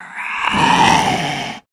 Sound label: female zombie attack; nmrih; zerosiki; slender